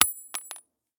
shell 39mm carpet; STALKER; IWP

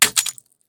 pistol drop glass; STALKER; IWP

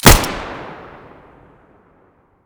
muzzle fiveseven stereo, weapons

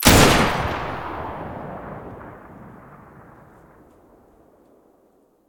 muzzle pkm stereo

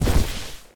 scrape, dead body collide; STALKER; IWP

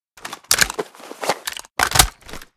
fiveseven reload, weapons

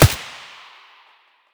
svu muzzle mono distant, weapons

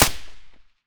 m4a1 silencer mono distant, weapons

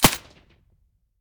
silencer; weapons; pp1901; vityaz

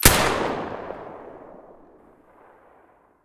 silencer sv98 stereo

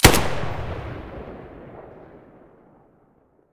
muzzle galil stereo, weapons

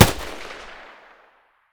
ump45 muzzle mono distant

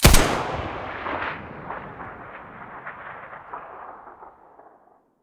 sa58 muzzle mono

